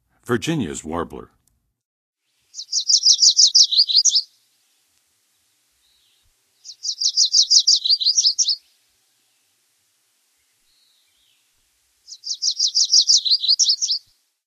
Virginias Warbler, Songs of Birds of North America; Western USA; Western USA Songbirds